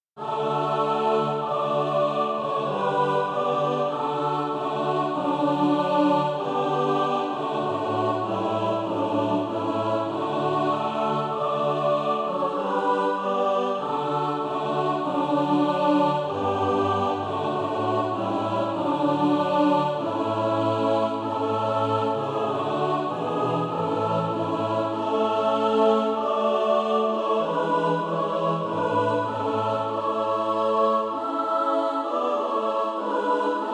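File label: mixed, SATB Practise Music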